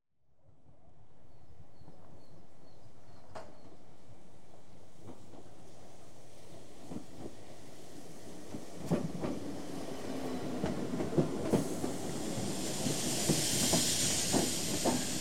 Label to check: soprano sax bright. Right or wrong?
Wrong